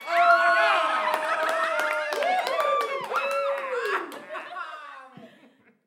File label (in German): klatschen; Fire: Ungh's Quest